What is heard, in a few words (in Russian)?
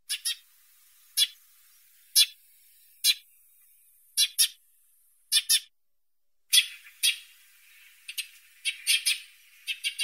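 chirik vorobiya, sparrow chirp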